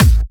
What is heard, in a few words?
Bassdrums